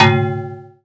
KLUUNNG; AMG - Vince Clarke Lucky Bastard

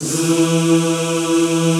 ZZZ E2, Classical Choir; Best Service; Extended Classical Choir

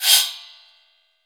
STEEL

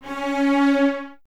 Cellos, String Ensembles; C#4; Miroslav Vitous; LINKS ORCH

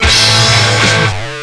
RIFF GTR, GUITARS KIT; Big Beat Samples & More; AKAI

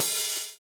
VINYL OH, HH OPEN, Drums; USB Soundscan vol.11 - Drums Dance & Groove; AKAI

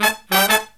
Killer Brass Riffs, SOUL

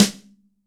AMB SN, AMBIENCE SNARE

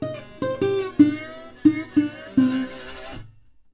Blues Guitar Samples; ILIO - Fretworks

